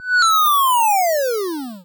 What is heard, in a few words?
turnoff, Retro Sounds 32-Bit